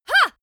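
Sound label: female attack ya soft short, screams; schreie; menschen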